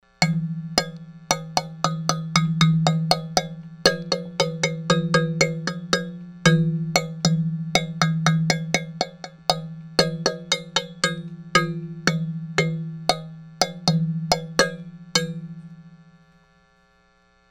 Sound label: fan copper pipe, piezo; estrella fan; phy103